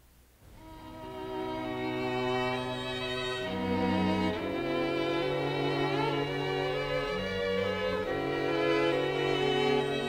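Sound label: piano quintet in G; schmidt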